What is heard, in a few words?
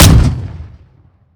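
dpapa12 fire player, weapons; gmod; iw8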